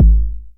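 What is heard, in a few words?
909 BD tape, kick drum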